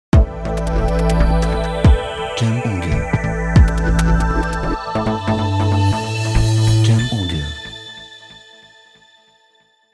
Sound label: Technology Logo